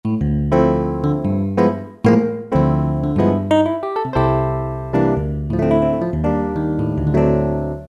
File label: Electric Piano, Roland PN-D50-02